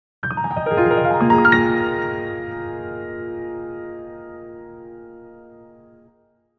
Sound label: Battle Victory Jingle; Bayonetta Origins